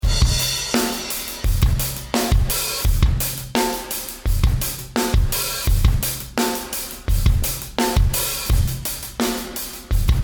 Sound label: Drumbeat SSL Shape